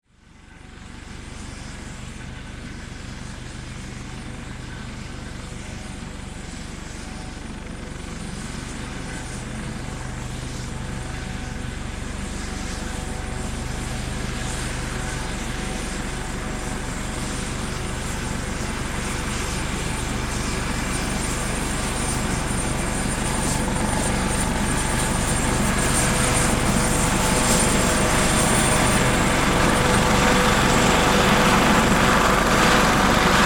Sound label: Helicopter Taxi By, Aircraft, Helicopter, Taxi, By, Hh-65, Dolphin; Transportation; Helicopter Sound Effects